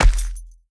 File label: Footsteps Dirt Run; The Town